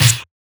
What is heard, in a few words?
snare reversed; drumkits; Snares; fl studio; goodtakimu drumkit